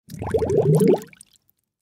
Water Bubbles Gurgle Blow Air Through Straw Surface Short; Gargling; Blastwave